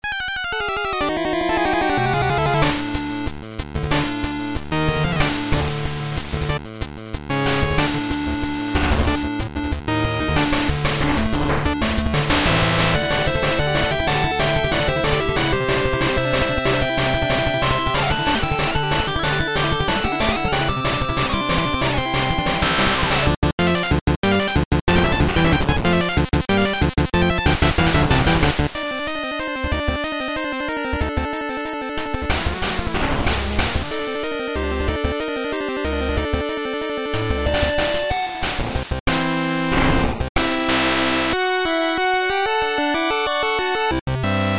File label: firered gym 8 bit